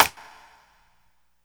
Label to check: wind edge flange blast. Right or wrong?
Wrong